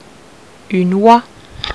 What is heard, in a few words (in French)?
oie; animaux